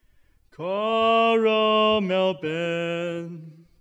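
singing male western power sinusoidal, Sinusoidal component; Western Male Singer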